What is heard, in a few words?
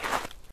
snow step; Golden Crayon Sounds